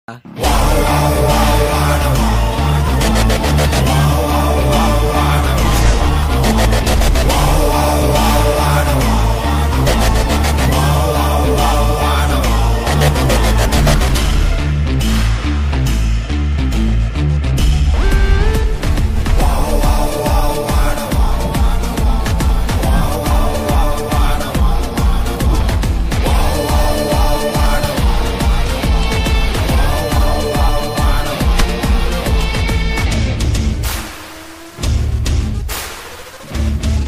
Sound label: mass bgm ringtone, tamil; entry scene; tamil movie